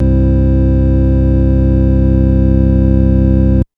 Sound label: Organ Synth c1; TrashLibrary